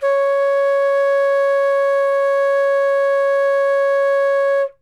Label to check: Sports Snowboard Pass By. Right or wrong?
Wrong